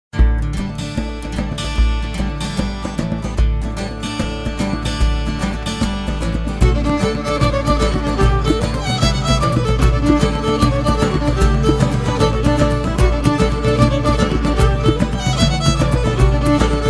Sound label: IRLANDA Irish tavern music